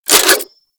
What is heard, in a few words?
Spin B; Warframe; Zylok Prime